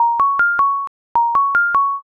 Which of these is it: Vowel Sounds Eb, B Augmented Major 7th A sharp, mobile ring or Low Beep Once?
mobile ring